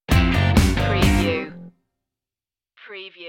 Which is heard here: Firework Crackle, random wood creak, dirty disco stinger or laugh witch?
dirty disco stinger